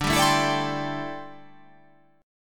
D 7th Suspended 2nd Suspended 4th D

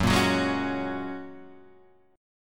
Gb Major Add 9th, down, Guitar, Drop D

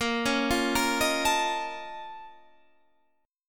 A sharp Minor Major 11th, A sharp, down

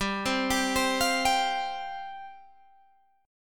F Suspended 2nd G

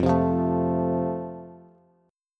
F Major, Bass; strummed

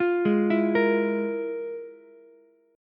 F Minor Major 11th, Ukulele; arpeggiated; JGuitar

